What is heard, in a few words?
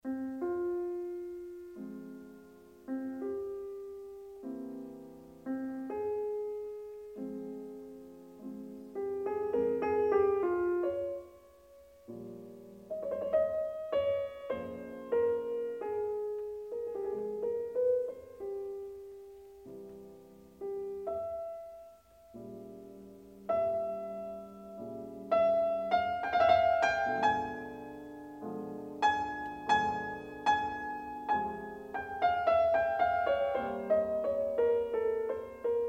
Variations for Cello and Piano